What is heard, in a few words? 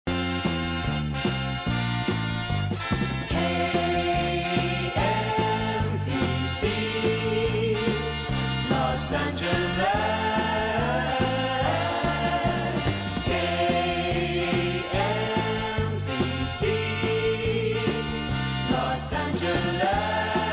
Horns